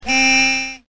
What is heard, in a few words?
jeep horn